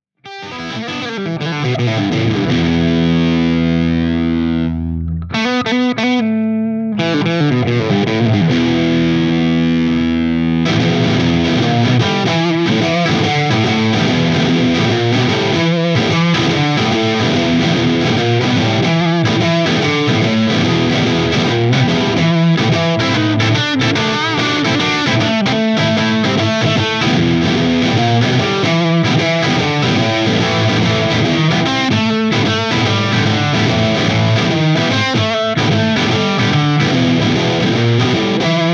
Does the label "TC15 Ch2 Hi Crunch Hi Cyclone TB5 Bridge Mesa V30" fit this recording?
Yes